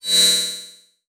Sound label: Beep Long; Multimedia Internet CD-Rom Flash